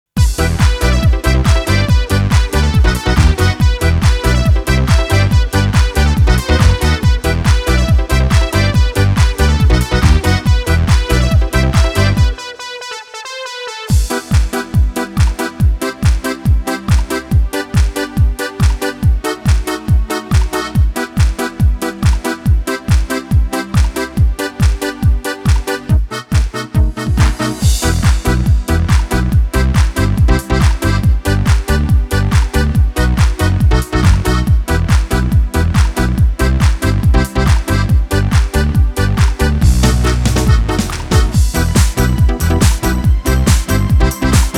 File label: Disco